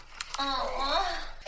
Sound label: loud moaning